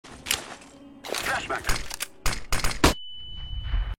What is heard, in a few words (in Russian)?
zvuk fleshki